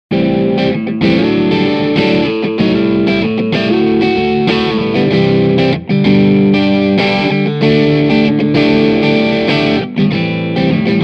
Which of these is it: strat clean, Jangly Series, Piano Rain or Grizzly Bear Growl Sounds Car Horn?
Jangly Series